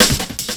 Pickup, Drums & Percussion; Sonic Foundry (Sony Creative Software); Futurist Drum & Bass

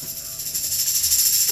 Dance Drums

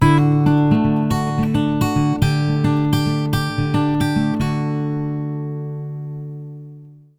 110 MAJ PICK D, Acoustic Guitar Loops; USB Soundscan vol.04 - Electric & Acoustic Guitar Loops; AKAI

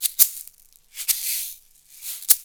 Roots Reggae; Sounds Good

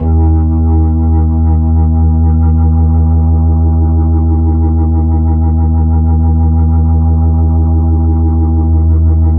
Hammond F; 3D Sound; 3D Keys VOL-1

